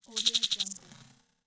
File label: organic horror interface fleshy transition